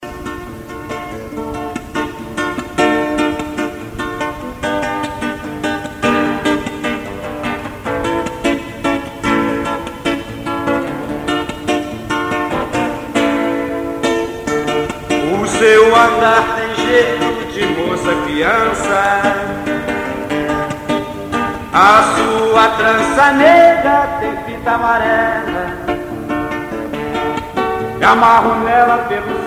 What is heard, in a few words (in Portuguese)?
voz violao